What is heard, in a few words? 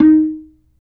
B-3, Percussion; Roland; Keyboards of The 60's and 70's